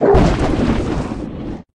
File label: lunge